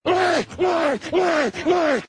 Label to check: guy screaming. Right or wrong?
Right